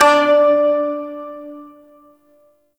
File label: Shawzin Oct Two D; Warframe